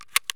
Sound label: ammo load; Escape from Tarkov; ambush